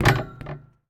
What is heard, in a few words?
Street Ball Glass Rim; SFX Kit